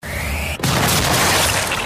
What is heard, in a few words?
explosion; killer queen